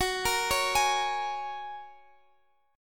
F sharp Major Add 9th, Guitar DADGAD

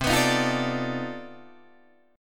C Minor Major 13th C down Guitar DADGAD

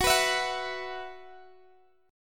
F sharp 7th Suspended 4th, Guitar, Drop D

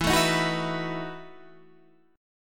E Major 7th Suspended 2nd Suspended 4th

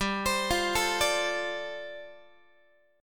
G 7th Suspended 2nd Suspended 4th G, Guitar; G7sus2sus4 arpeggiated

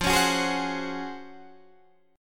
Db Major 11th F

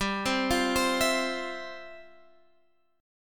G 13th Suspended 4th G; arpeggiated

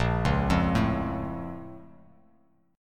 A sharp Minor Major 7th, Bass, chord; A#mM7 arpeggiated; Bass Standard; JGuitar

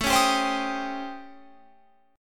A 7th Sharp 9th Flat 5th A, Guitar Open F; strummed; JGuitar; 21,20,19,19,22,22

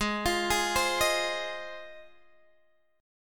Ab 6th Ab, Guitar, Open G

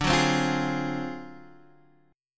D 7th Sharp 9th Flat 5th; x,5,4,5,6,4; strummed; JGuitar

